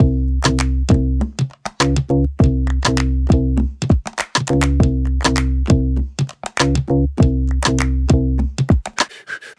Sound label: Thiccstep, Loops